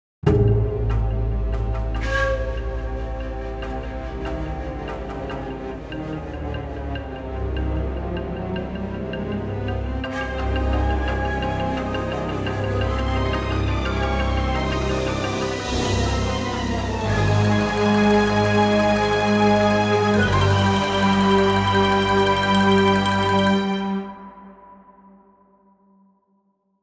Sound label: Build Up; Gashadokuro